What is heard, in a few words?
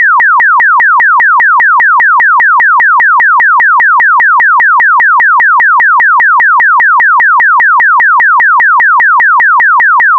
chirp; chirp toys